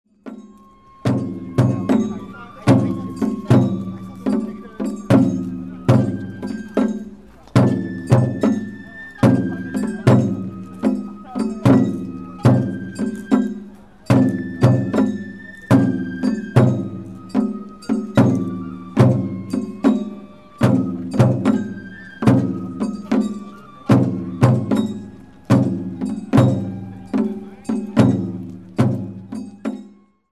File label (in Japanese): 本囃子, 弥生祭お囃子; 上鉢石町; 弥生祭は日光二荒山神社の例大祭。